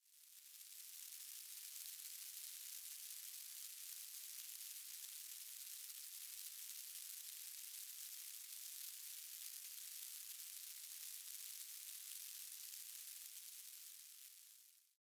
light drizzle

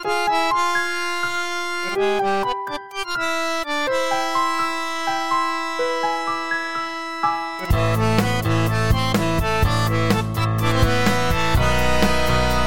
electro bandoneon

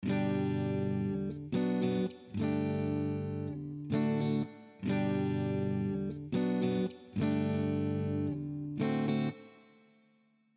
strato wet; Chiqui Audio; Free Wet Chord Progression 4 100 Bpm G Maj; Fender Stratocaster

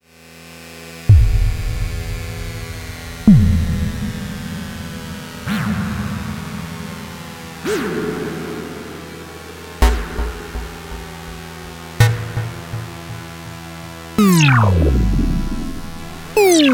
impacts and drones

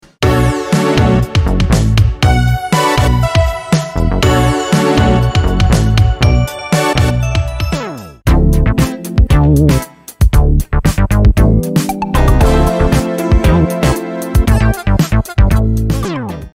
e funk synth

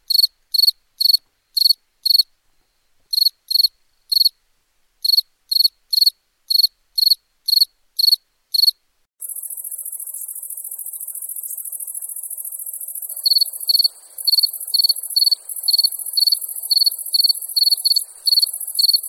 cricket sounds

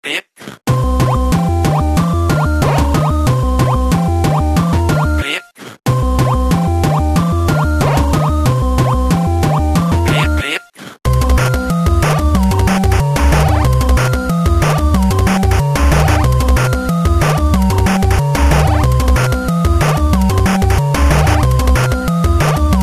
the bleep drum

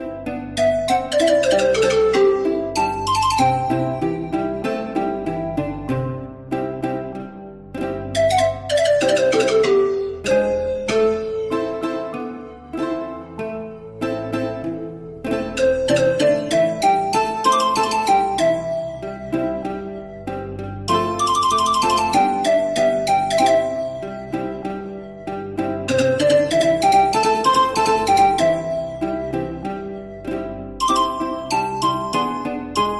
bamboo panpipe edge blown

